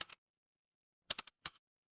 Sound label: office keyboard